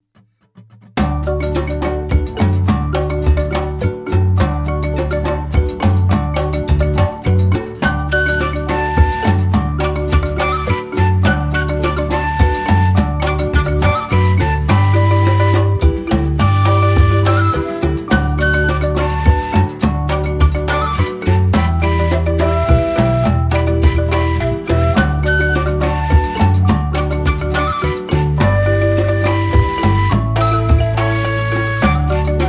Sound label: African Rainfall Instrumental